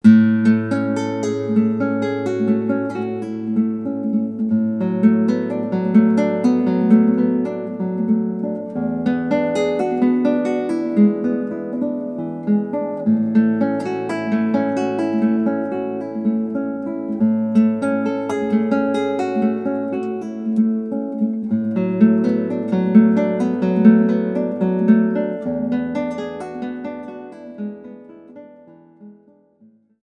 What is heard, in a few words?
Guitar Recital; Gitaarboek